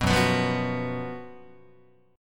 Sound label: Gb Major 7th Suspended 4th Gb Guitar